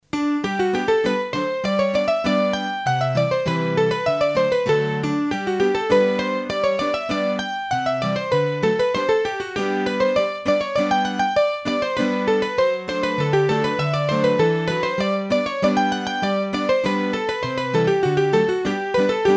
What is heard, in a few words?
Scottische, hurdy-gurdy; Massif Central; trad; Scottische de Crassier